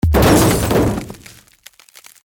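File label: variant with windshield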